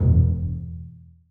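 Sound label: basses pizzicato d#3; Sonatina Symphonic Orchestra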